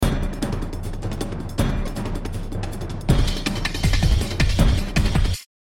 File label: storm drum; stormdrum rmx